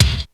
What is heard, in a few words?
kick; xr10